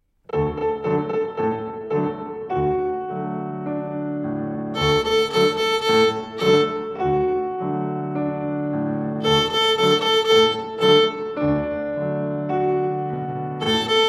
Rhythm on A

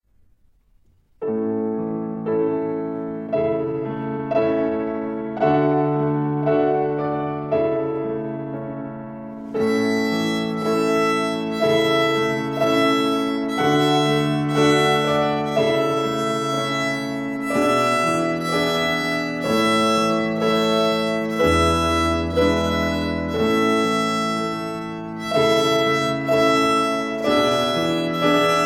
The Theme on the Open E String

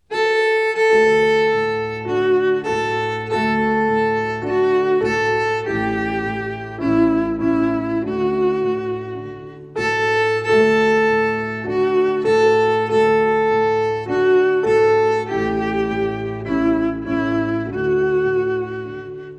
O Come Little Children. Folk Song

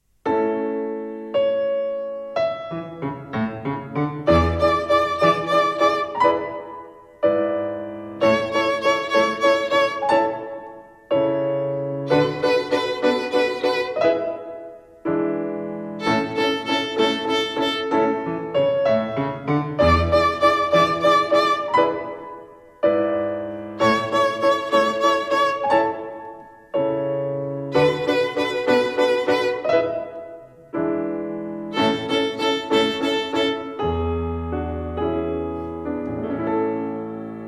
Rhythm with Three Fingers Fourth Twinkle Rhythm

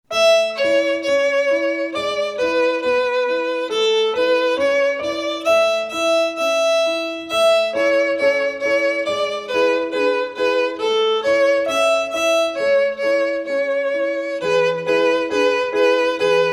Suzuki Violin Method Lightly Row Folk Song

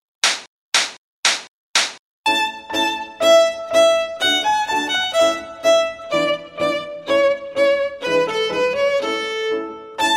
ALLEGRO

